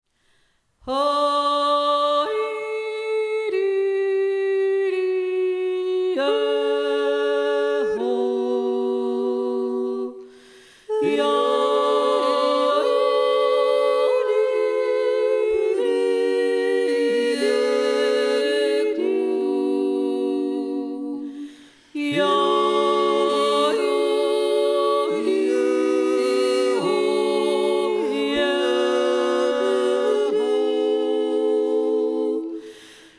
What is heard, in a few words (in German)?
Jodler